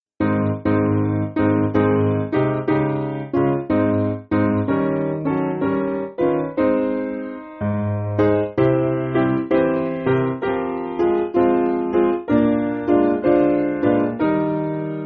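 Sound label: Piano, Backing Files for Churches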